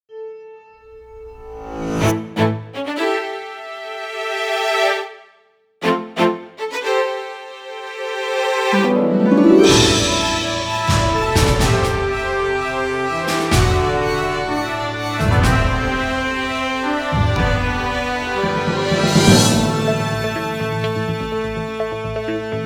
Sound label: music only